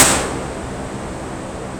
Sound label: NOISE KING, Yamaha VP-1; Music Store